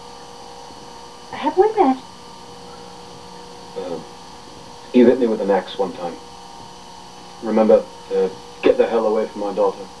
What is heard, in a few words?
axe